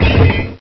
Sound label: clunk it